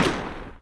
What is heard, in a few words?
Inf Weapons; Red Orchestra 41-45